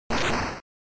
General Noise Phrase

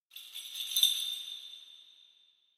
Kagura Suzu

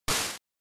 General Noise Single

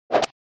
SNES Golf Shot

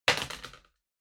Uchiwa Drop

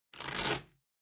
Sellotape Peel Off

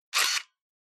Camera Film

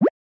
Motion Pop

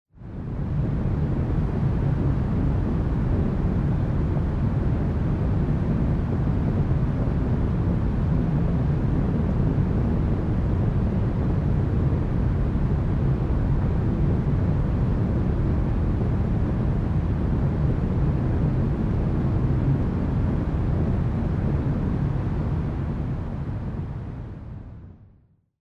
Rumble Long; Onoma

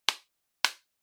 Sensu Slap

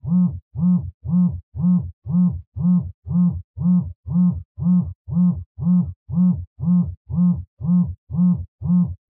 Cell Phone Vibration Blanket

